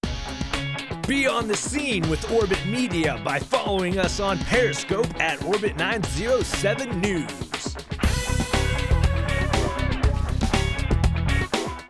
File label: Sweep; Periscope